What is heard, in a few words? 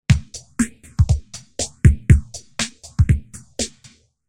phaser